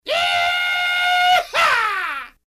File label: yeehaa